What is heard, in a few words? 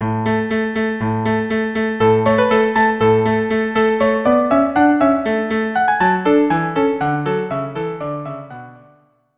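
tambourin violin cello; gossec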